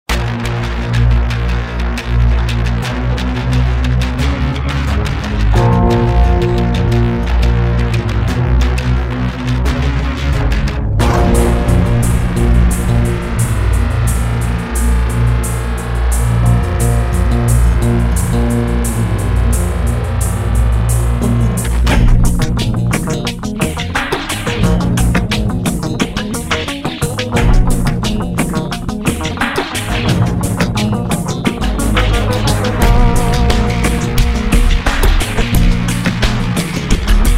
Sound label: Remixed Acid Uptempo